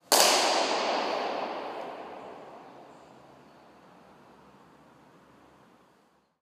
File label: Maus IR, Theodoric Mausoleum, Ravenna; Theodoric Mausoleum, Ravenna 6"; Infinity Room